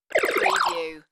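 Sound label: Electric Bubbles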